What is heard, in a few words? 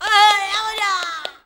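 rhythmic vocal; Best Service; World Colours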